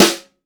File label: acoustic snare sound E key